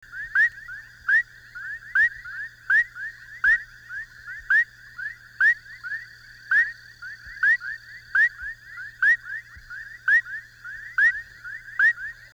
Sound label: Leptodactylus bufonius; amphibia